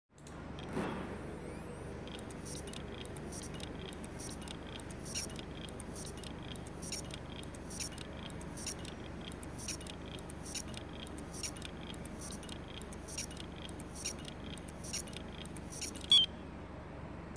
HEADS SURFACE HGST 2.5 500GB, damaged hard drive sounds